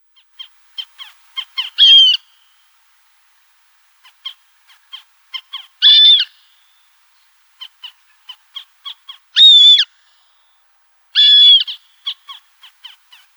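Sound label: Montagu's harrier; Lun lugovoj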